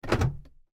Car Door Lock

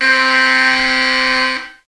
Old alarm clock

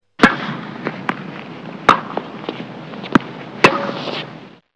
tennis

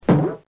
Boink Lo; Mono

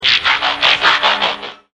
transform

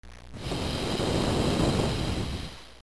snake

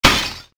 Plate Glass Breaking

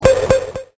3 bonks

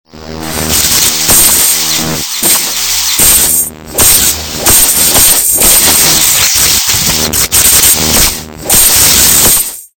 sword

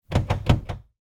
car door handle

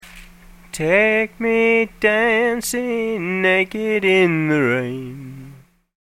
rain

